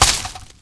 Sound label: bush; Mafie 1